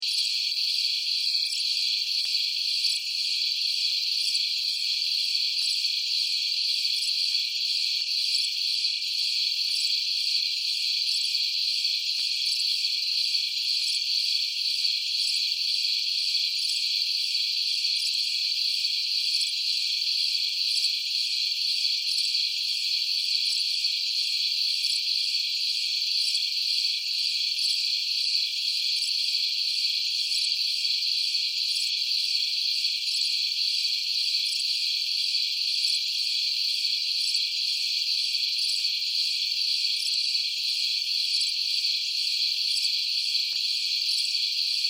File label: Forest Night, Ambience, Forest, Night, Chirps, Insects, Bugs; 5.1; Surround Sound; BlastWave